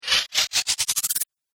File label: Android Power Up, robot sound effects